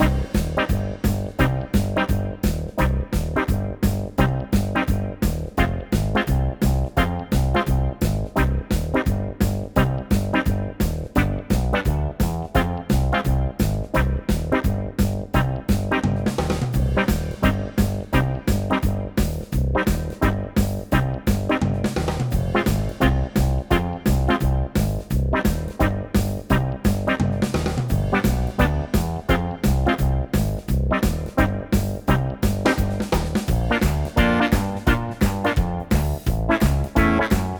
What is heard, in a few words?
Monster Jive, funny